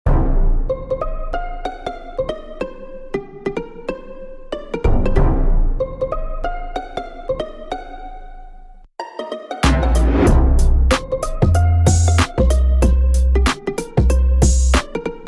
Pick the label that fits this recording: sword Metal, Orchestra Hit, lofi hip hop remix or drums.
lofi hip hop remix